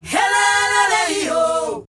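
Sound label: "HEHLELEYO", World Voices South African; ILIO; Vocal Planet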